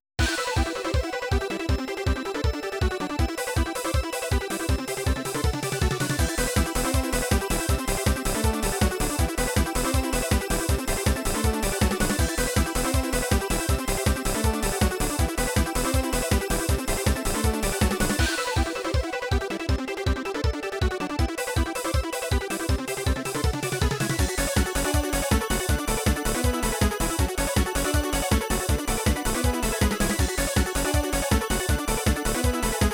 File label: Techno; Sony Ericsson